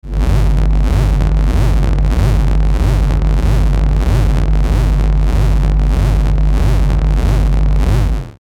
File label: static ascend; Static Ascend Descend Warp Rumble; Production Element Imaging Element Accent Transition; Blastwave